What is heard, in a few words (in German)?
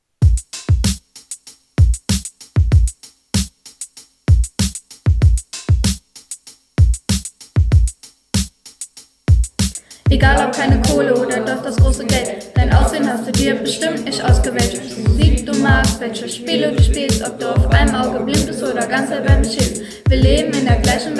Rap